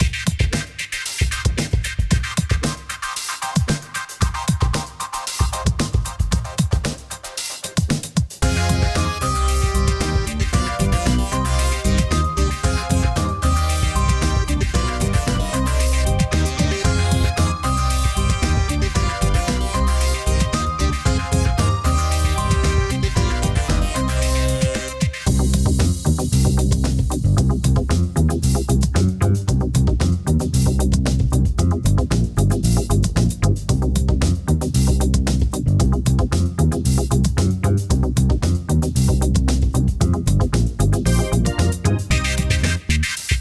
Ambiental; Bratz Flaunt Your Fashion

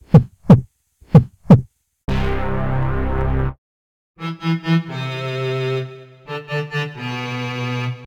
Propose Failure; life is a game android

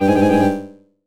Snap Game Fail

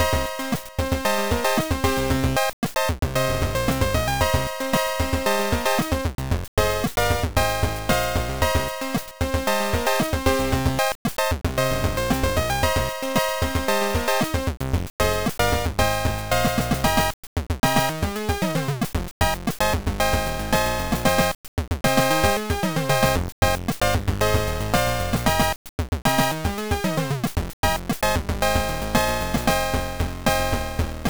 Disco; Sadakichi Seven: Hideyoshi no Ougon; TurboGrafx-16

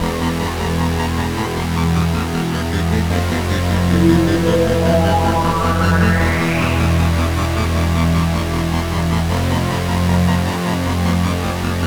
synths; the warriors 2005 ps2; destroyers fight3

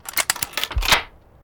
Lock Pick Unlocking

